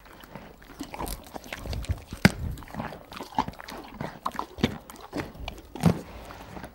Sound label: Horse Eating Carrot